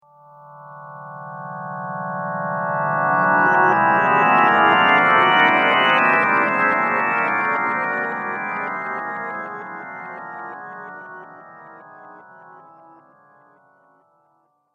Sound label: Haunted Chimes, Scary Tone Sound Effects; horror